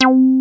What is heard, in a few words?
303 PLUG, BASSES; Pro-Rec - Synthsonic